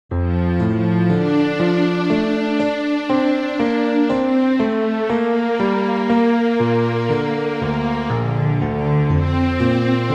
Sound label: piece in D major